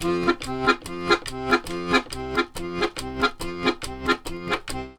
140, Complete Accordions; USB Soundscan vol.40; AKAI

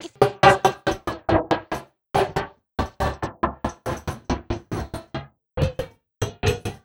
Envelope Beat; Drums & Drones

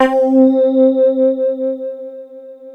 PPG 2.3, Analog Fantasies; USB Soundscan vol.49; AKAI

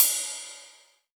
Ride Cymbal

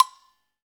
AGOGO H, ETHNIC PERC; Roland LA

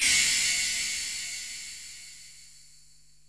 Perc, Korg Wavestation

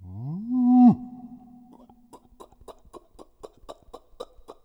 Bird Whistle; Quazibeat; African Secret; SFX

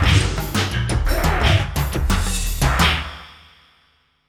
87 VRAC END 3, Industrial Loops

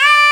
Mizmar D4; Planet Earth; Digital Sound Factory Planet Earth

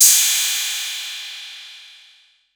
808, CYMBAL; CYMBAL P2

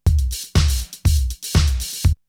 Drumloop; ZERO G - Datafile DISC 2

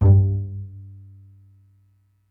pizz G1, pizz section; Greytsounds VOL-1

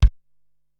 Dynamic Kick, Drum Machines Ensoniq SQ-R-Plus; 300 Drum Machines

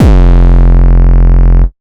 bass 808 bang F; Polyend; ARC Noise

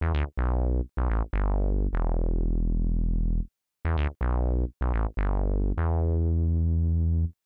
125 res synth bass E; PowerFX - Nu Jazz House

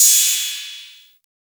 Hat, Drum & Bass

